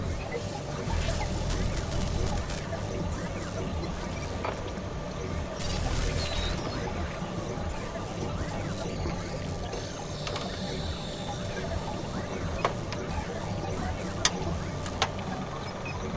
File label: feature Splash Loop; wildotron3000